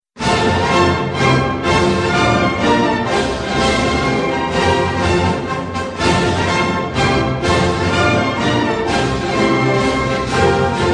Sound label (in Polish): hymn polski